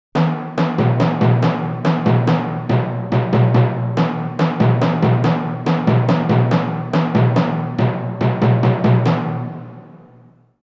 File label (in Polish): kotly